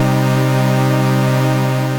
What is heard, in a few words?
Tech Chord A, Synth Keys; Wavetable; Ableton; Synth Essentials